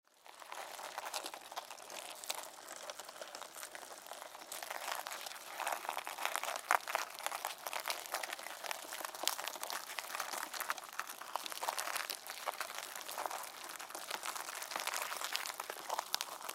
Insects Mealworms, Insects Mealworms Larva Movement Slimy; Bugs; Animal; Insect Sound Effects